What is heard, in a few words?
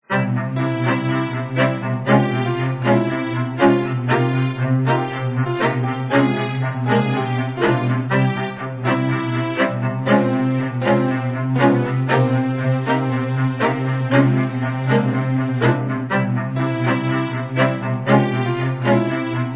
okarina